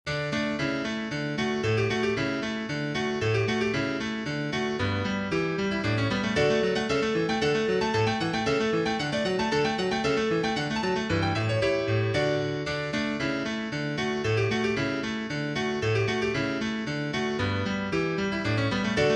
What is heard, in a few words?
Polonoise 1790; music from 1790